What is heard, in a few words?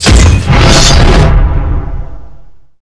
medal complete; basebuilder